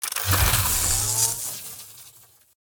Sci Fi Pistol

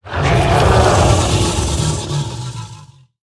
Naga Giant, Death

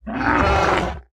Battle Ram Attack

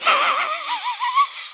Male Weasel Play

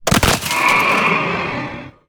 Undead Horse Death Underlay

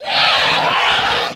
Duskbat Play

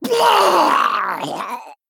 Male Murloc Death

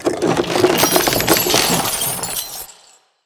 Wishing Well Play

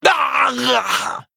Male Human Death

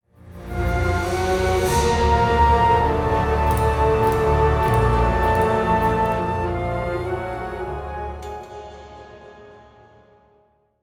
Play Stinger; Ysera Unleashed

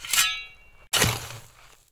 Burly Shovel Fist Underlay Play

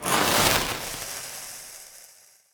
Cannon

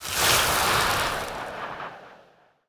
Dune Sculptor Play Underlay

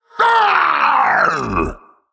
Male Qiraji Death

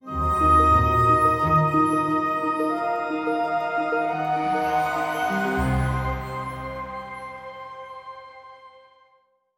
Play Stinger

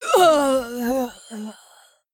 Death

